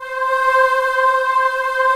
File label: CHOIR; B CHOIR